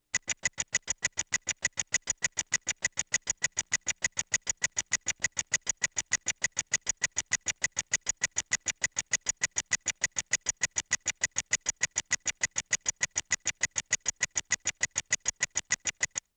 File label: Household Phones; Valentino Production Sound Effects Library CD50